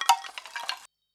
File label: perc; eddyflux